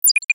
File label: Space MMC insert; Nokia N79; Audio themes